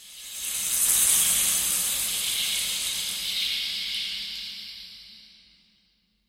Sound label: ambient maraca; evo maraca; wood